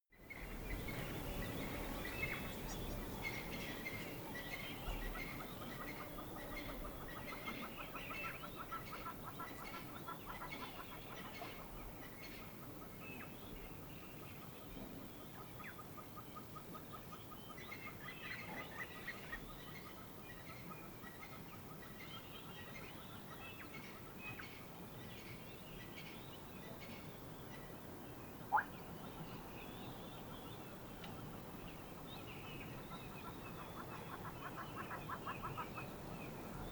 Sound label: water hole dusk birds insects windy, dschungel regenwald; atmosphaeren umwelt